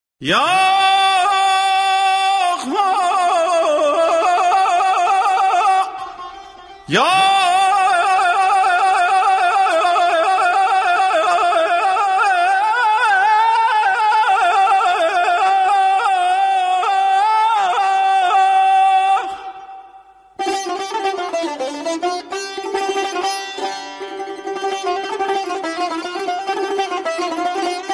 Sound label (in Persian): Saz Va Avaz